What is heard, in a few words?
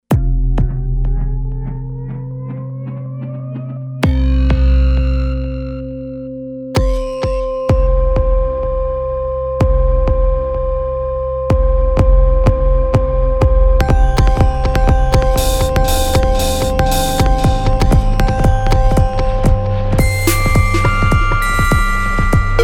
Beat Engineering